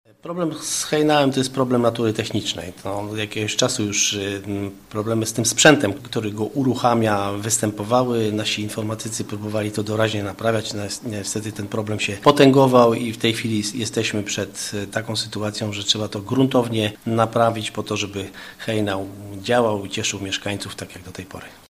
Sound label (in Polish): hejnal